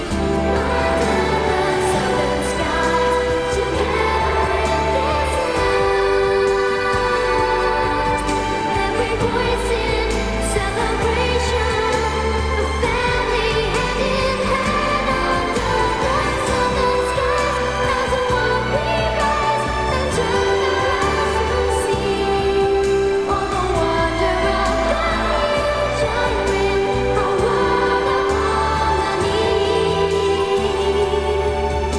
chorus

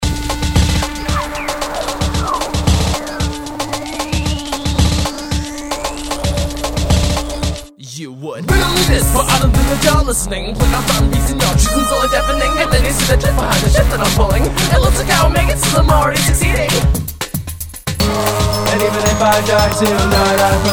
Breakbeat

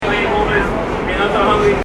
pan

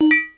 plink

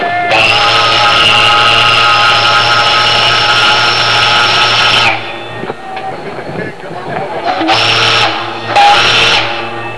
horn

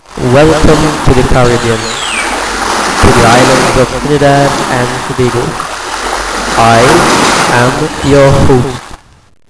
ocean

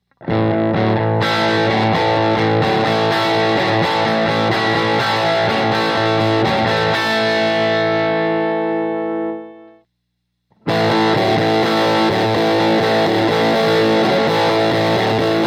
Vibrochamp

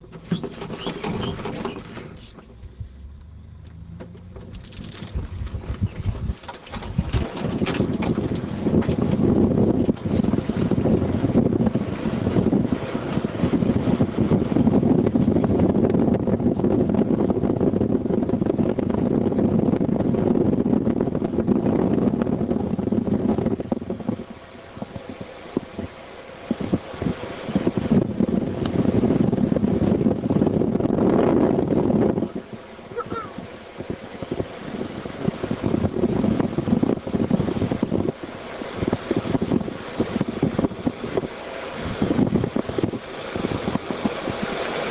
takeoff

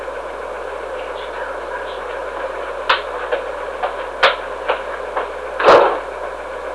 slam

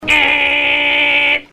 buzz

